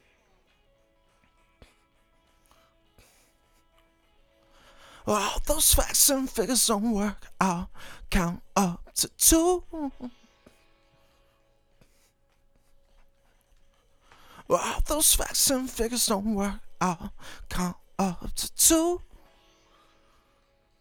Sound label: Vocal